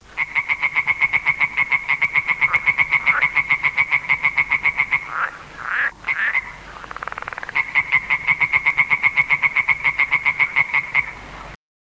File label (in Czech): rosnicka zelena; obojzivelnici